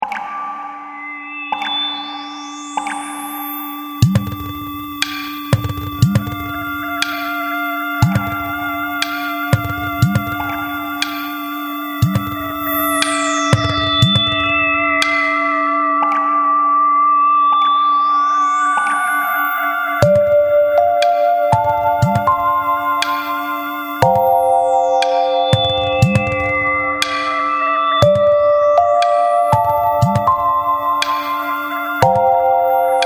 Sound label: slow ambience